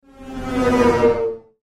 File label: Sci Fi Spaceship; Spaceship Pass By Fast; Spacecraft